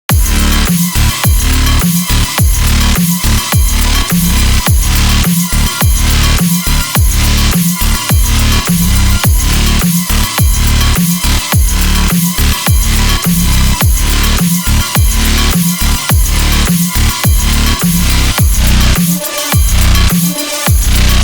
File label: Super Clang